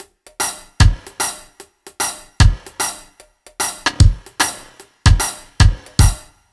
BT, Ragga & Dub Reggae

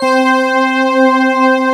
SYNCHR BRASS; AKAI S-Series CD-ROM Sound Library VOL-2

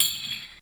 WS TAMB, drum; Crypton - DrumBase5000; WS DRUMS

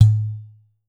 udu

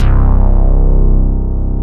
SYNTHADE C2, Synth; SYNDELIC

